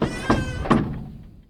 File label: Wood Rudder Movement; SFX Kit